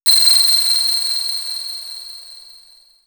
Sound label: Swirls, pads; X-Static Goldmine